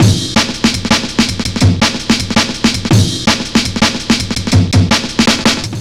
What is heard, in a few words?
165, Drum Loops, Drum & Bass